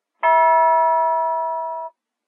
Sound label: ding, door chimes